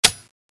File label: as50 clipout